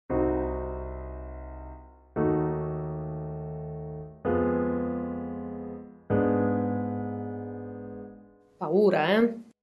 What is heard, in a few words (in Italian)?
catena accordi diminuiti; Comporre musica da film: il genere Horror e Thriller